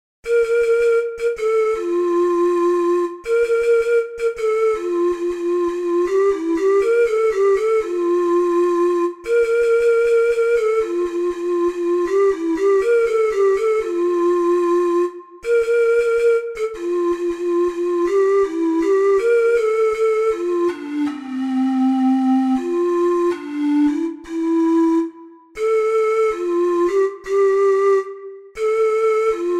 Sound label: la bamba, ocarina four hole